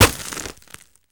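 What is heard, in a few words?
ricochet; Counter Strike Model Pack; CS2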